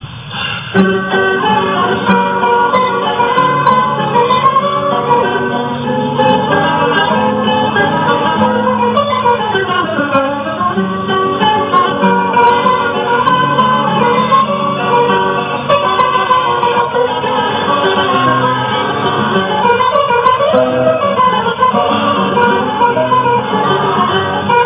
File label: rondeau, guitar